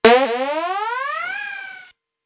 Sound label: laser beam